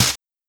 Snare, Fricke MFB 502; Drums

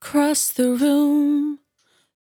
100bpm Bm, Velvet Vocal; Zero-G

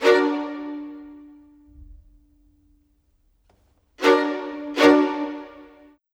Violins, Orchestral; Sonic Foundry (Sony Creative Software); Orchestral VOL-2; Modern 24

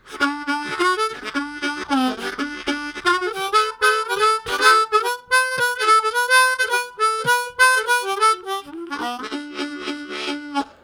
Shortfall, Harmonica; Zero G

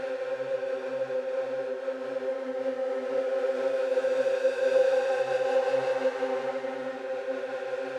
Drone; stereo toolkit samples; Non Tempo Loops

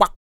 KUK, MALE PERC

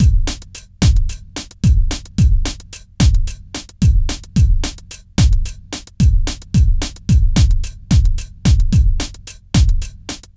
HOUSE, Funky; INIS

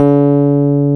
PIA MKS20, E-PIANOS; Sound & Vision; Gigapack I CD 2

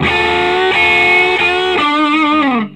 SOLO, Vintage Blues Guitar; USB Soundscan vol.22

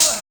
vocal hit; Drums In Your FACE!

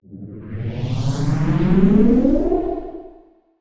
Pitched Noise

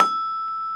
GENDER, Bronze Gamelan

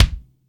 MIX KICK, TIGHT KICK

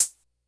Closed HH, Drum Machines, Korg DS-10

